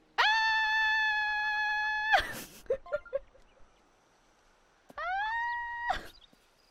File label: aaaaaaaaaaaaaaaaaaaaa, Scream; IRyScream